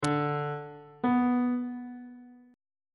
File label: relative minor of d major is b on bass clef